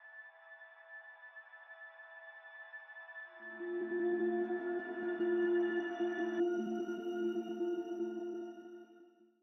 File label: Pads Intro